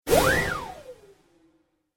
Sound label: Whistle Whoosh